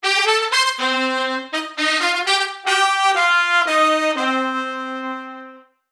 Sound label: Funky Horn Item